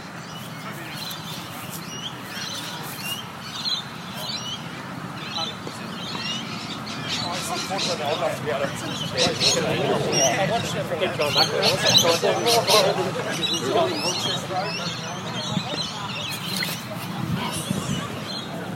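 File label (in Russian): пробегающие мимо футболисты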